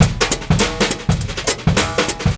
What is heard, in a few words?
BRUSH HOUR 102, OFFMIKE DRUMS